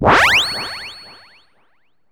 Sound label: SE CARD READ; maimai